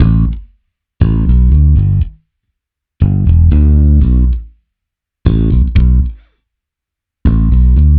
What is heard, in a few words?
Bass Guitar 120BPM Bm; 99Sounds x Monosounds; Instrument Loops